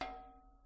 Step In Metal

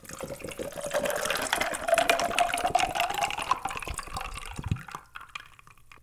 Pouring Liquid Into Standard Eight Ounce Cup

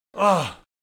Pain Male

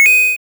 Coin

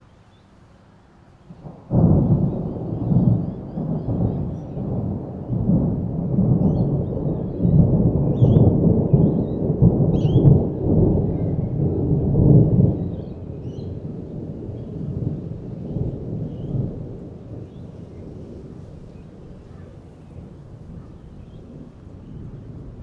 Rolling Thunder

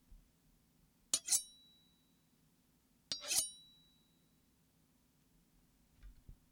Sword Sound

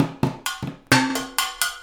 Improv Percs 1 Bar 130 Bpm

